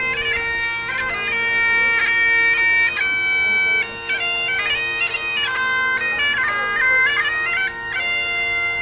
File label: bagpipes